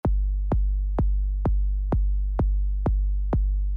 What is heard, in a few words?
4 on the floor